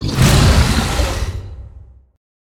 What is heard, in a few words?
creature roar; bruteshark